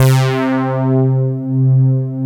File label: poly synth C3; Big Fish Audio; Synth City